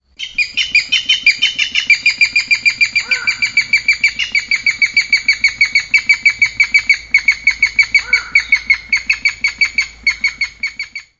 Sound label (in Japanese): yanbarukuina